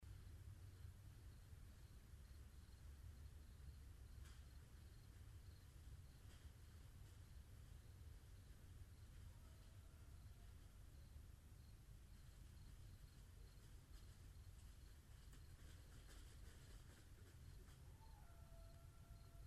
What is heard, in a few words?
Coyotes; Coyotes (Canis latrans)